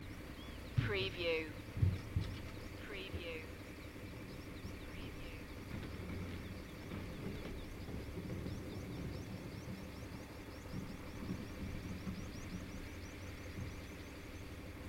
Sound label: Vehicle engine tickover and clatter in a rural area; beanstalk audio